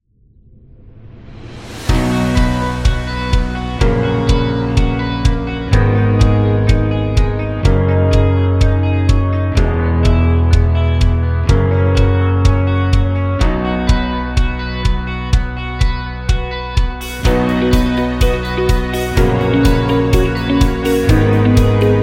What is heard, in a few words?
A Corporate; corporate music vol 5